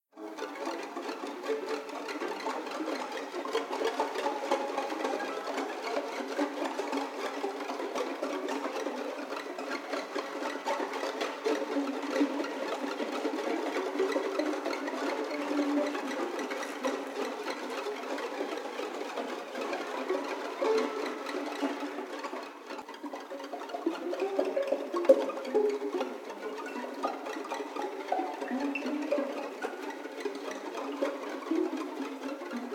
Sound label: Rat Scary